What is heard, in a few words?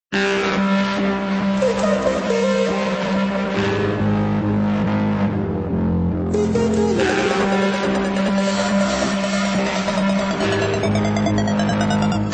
Distorted Bounces